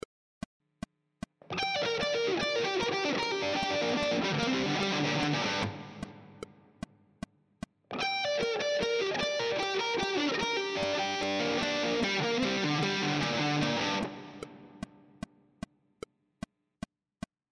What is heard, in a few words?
triad arpeggio sequences, guitar